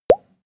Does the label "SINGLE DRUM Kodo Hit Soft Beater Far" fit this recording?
No